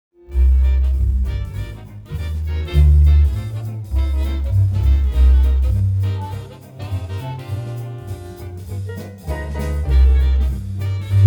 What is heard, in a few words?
bass